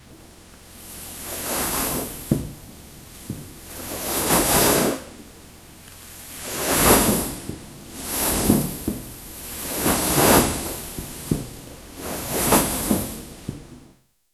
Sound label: a soft object appears